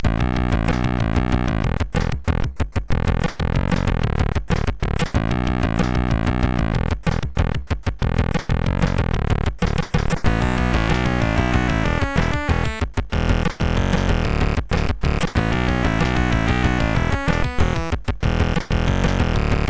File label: sid MOS6581R2; Arthrobob